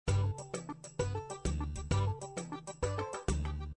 Scifi; Pinky Dinky Doo: Your Storybox Online; windows

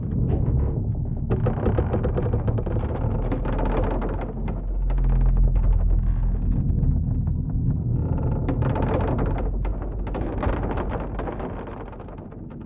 Dock Creaking; silent hill homecoming 2008 gamerip pc ps3 x360

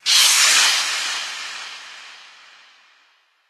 Stinger Body Bag; silent hill homecoming 2008 gamerip pc ps3 x360; Ambient

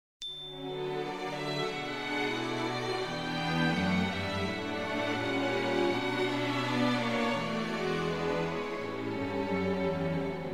love song, instrumental; Hokuto no Ken 2

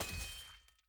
Attack Axe

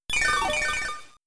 In-Battle Heal HP Restore

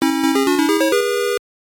All Clear; Pocket Puyo Puyo Tsuu soundtrack

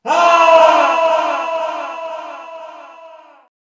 scream; lucius

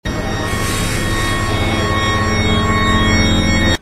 Shade Jump; Five Nights at Treasure Island 2020 Remake; windows

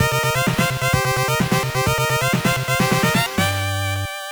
Mono Stage Clear

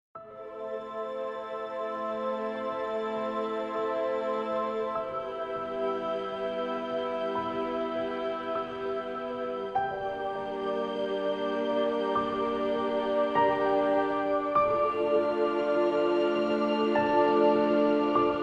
Clock; Sands of Salzaar

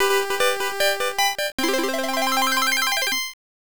Won Medals

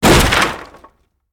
World Crash; Angry Birds Epic

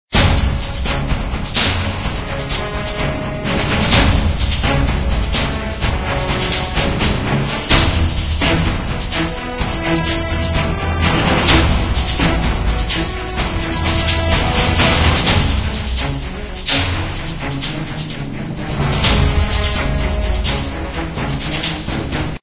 Music; Brave Fighter 2 Monster Legion; Android

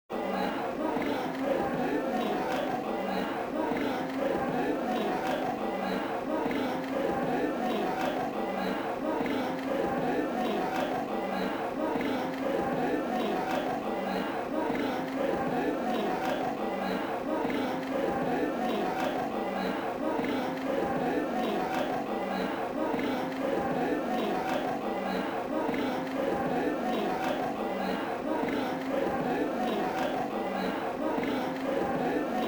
Ambient Music; The Last Blade; Akari Ichijo Stage Introduction

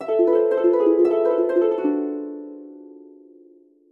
Fanfare Discover

